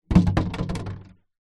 foley water cannister plastic 10 ltr down on concrete ground; zapsplat